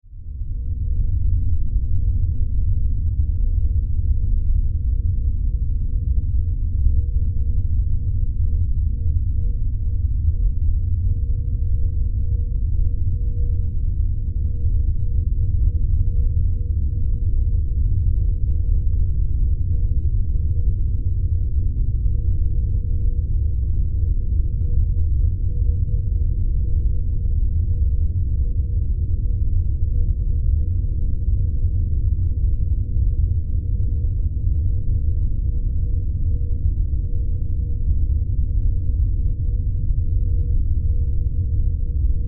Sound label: Science Fiction Drone Power Hum; Drones (Sound Effects:Sound Design)